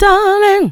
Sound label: DARLING, Funky Vocals, SHOUTS; USB Soundscan vol.18